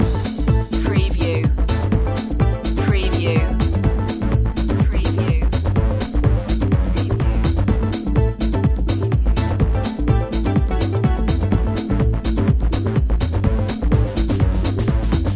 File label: pulse line loop